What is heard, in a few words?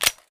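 mp5 weapon bolt in; Escape from Tarkov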